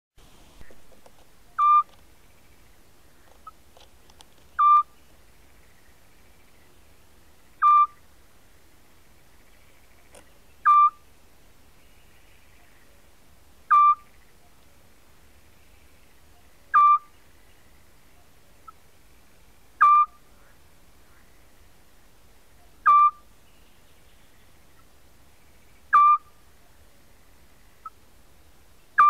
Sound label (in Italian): verso assiolo; fauna